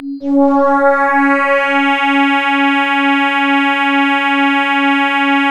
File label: C#, Synclav FM; Big Fish Audio; SPACE1